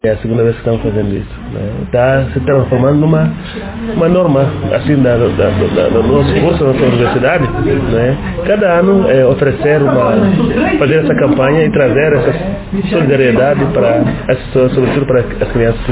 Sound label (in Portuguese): Samba